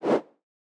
air woosh; soundscrate

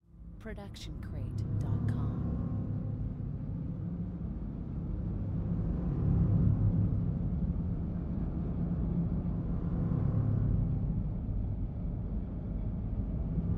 Creepy Low Grumble; SoundsCrate

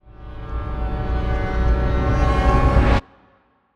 orchestra riser; soundscrate

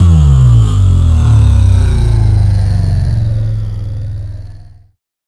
Bass Drop; SoundsCrate

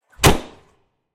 loud arrow impact; soundscrate

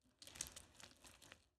squishy medium; soundscrate

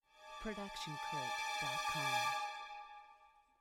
tense strings; soundscrate

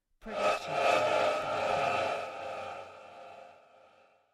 horror ghost whisper; soundscrate

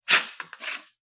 paper tear; soundscrate

